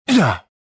land; jedi

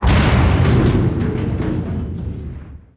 explo rumbly debris; impacts explos

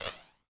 bad zap

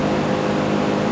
machinery; Ambient; MX vs ATV Unleashed

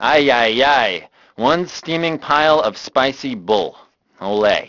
band; Grand Theft Auto Vice City; XBOX

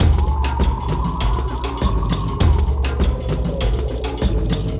tribal; hawaii

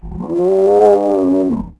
Pachy Groan, Dino

